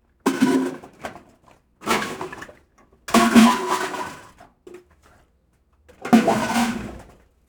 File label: picking up a full bag, rummaging